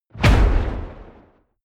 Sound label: fire whoosh hard; swooshes-swishes